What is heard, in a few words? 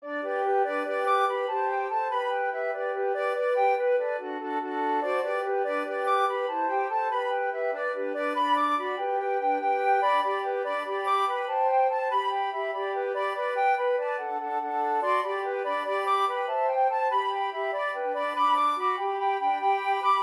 Flute Trio Three Irish Jigs